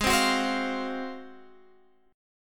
G sharp 13th; G#13; strummed; x,11,10,11,13,13

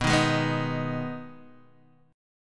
B 6th B down Guitar Half Step Down

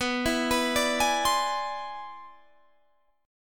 C Augmented Major 9th B

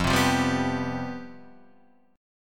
C Major 7th Suspended 4th, F, guitar chord

